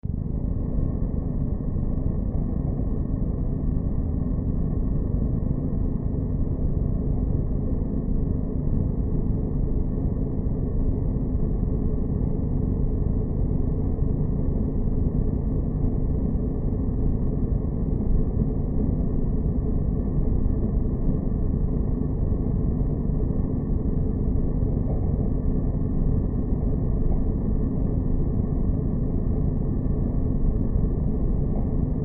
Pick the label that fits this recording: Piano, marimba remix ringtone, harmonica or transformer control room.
transformer control room